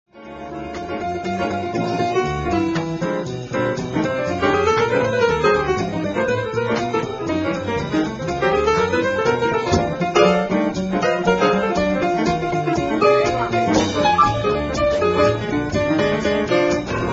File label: Blues